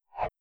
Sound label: Transfer Complete